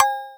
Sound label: Percussion, House